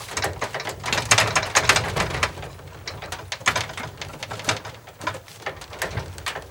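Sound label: metal sheet rattle wind; Call of Duty Modern Warfare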